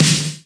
Snare D Key, acoustic snare, Loudest frequency: 3541Hz; snare drum samples; drum single hits one shots